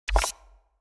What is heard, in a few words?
UI Rank List Move; Blue Guardians